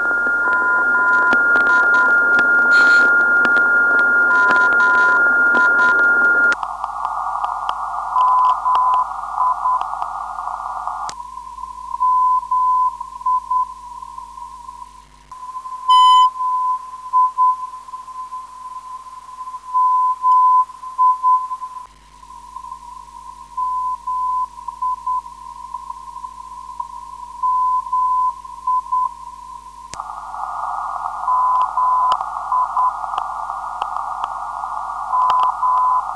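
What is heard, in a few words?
355kHz, non-directional beacon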